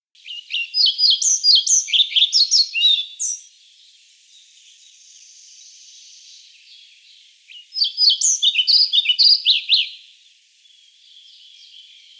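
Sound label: muholovka pestrushka golos, pied flycatcher voice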